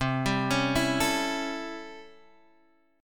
C 6th Flat 9th chord; C6-9 arpeggiated

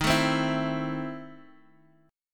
E Major 7th Suspended 4th Sharp 5th D sharp

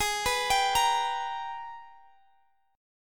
Ab Minor Major 9th; AbmM9; arpeggiated

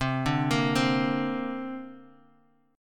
C 7th Suspended 2nd, C, down, Bass Standard; arpeggiated; chord; C7sus2

